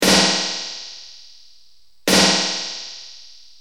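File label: snare dm100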